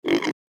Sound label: animal damage; Minora-Game; Sound-Artlist